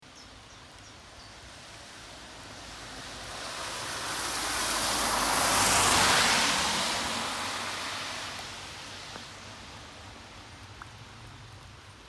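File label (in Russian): proezjaiushiy avto po mokroi doroge, car passing on wet road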